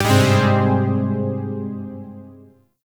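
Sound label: Shawzin Minor Chord K; Zariman Shawzin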